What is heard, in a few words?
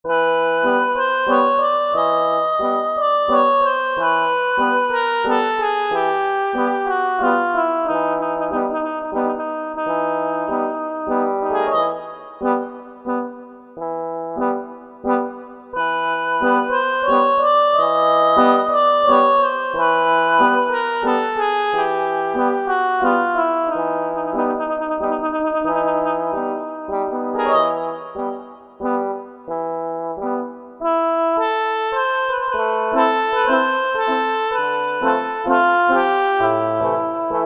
Corli Oboe, Synth; Synth 1